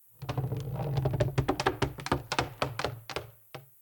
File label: Exterior Wood Creak; The Town; 3D Environment